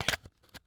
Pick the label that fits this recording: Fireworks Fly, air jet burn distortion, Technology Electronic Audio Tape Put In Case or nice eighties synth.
Technology Electronic Audio Tape Put In Case